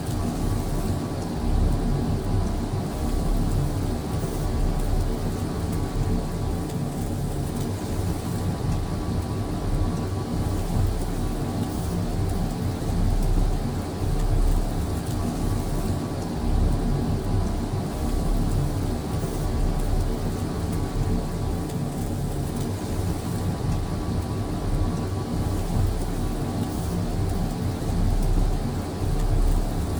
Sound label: ship destroyer idle; Stellaris; machineage